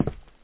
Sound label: footstep; fear